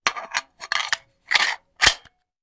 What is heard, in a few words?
Hand Gun Foley; Sound Ideas; Guns